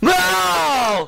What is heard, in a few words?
meow